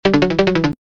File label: win huge; games; Cash Runner